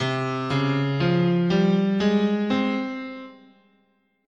C Japanese, scale

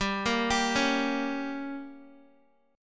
G Diminished, Guitar; arpeggiated; JGuitar

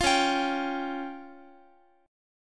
C sharp Major Flat 5th, Guitar; C#Mb5; strummed

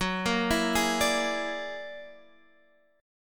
F sharp Augmented 9th, Guitar Half Step Down

